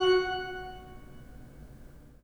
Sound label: pipe organ, 3-D Audio